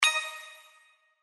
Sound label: Bonus Coin; The Lucky Fairy